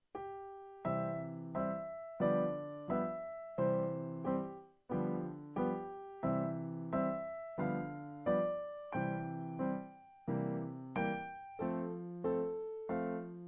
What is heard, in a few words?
jazz